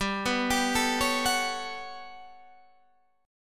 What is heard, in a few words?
G Major Sharp 11th G, Guitar Standard; GM#11 arpeggiated